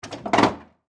Lawn Mower Hood, Groundsmaster, 1000-L, Lift, Golf, Course; Toro; BlastWave